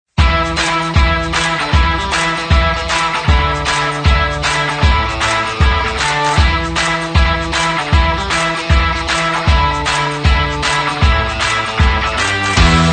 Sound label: Energetic Upbeat Rock